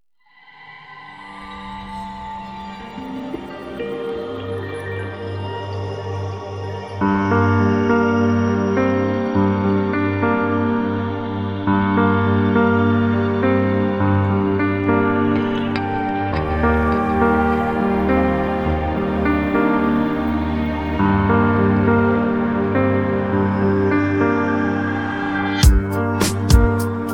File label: instrumental; persian